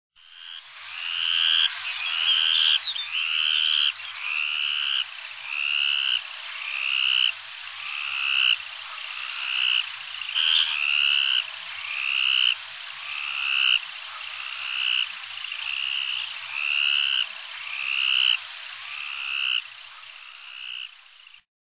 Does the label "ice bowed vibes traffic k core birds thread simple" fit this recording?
No